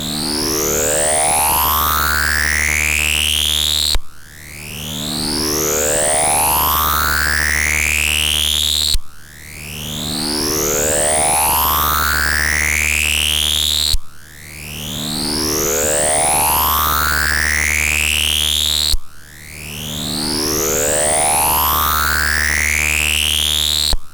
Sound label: x odd mod, NOISETOASTER